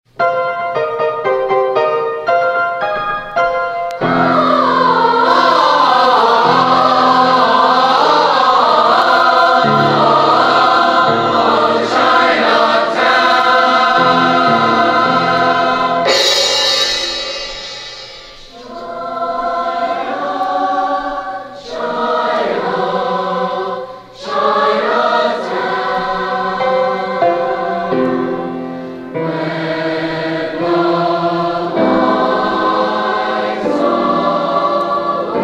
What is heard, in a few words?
junior high school choir dance band; stanforth junior high school